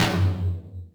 Yamaha RM 50 Toms

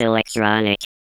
electronic, audioloops

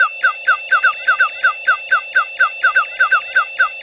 Wacko Birds; Sound FX

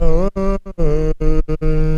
TRANSV, Scratches; The Tables

